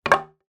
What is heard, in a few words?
impact wood post drop concrete ground; zapsplat